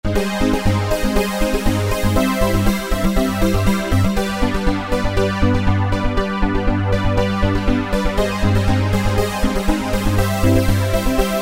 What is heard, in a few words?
Roland V-Synth; Chorduroy; Factory Patches